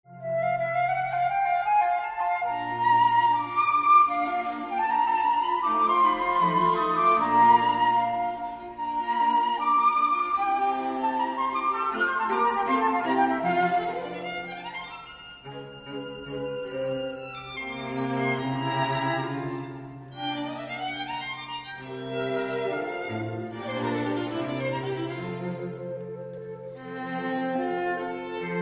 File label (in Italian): Sonata per Flauto e Archi; G. Rossini